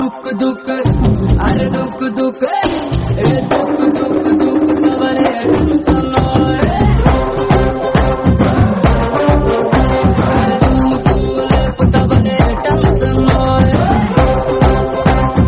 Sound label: Tension Ringtone; Dookudu